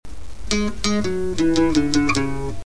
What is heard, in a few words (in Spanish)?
rompiste intro, bajo sexto y acordeon